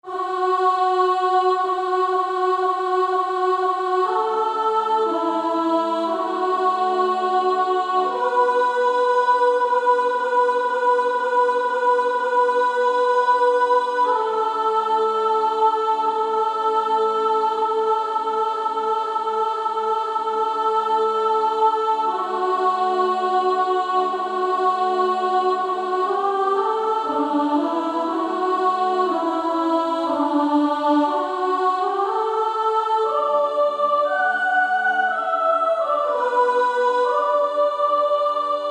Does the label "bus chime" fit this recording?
No